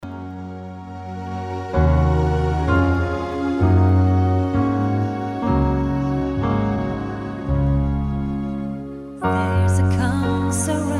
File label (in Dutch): live muziek huwelijk